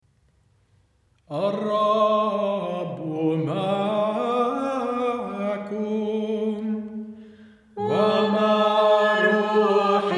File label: Gospel Melody D; ألحان الإنجيل د